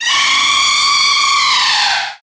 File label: Redead Scream